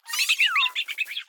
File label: Cry; Spriole